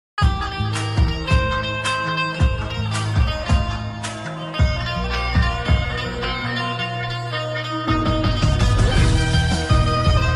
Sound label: instrumental cover banjo music